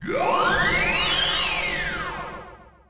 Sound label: gate opens